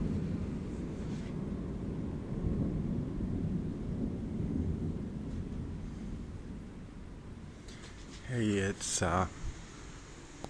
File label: thunder storm with no rain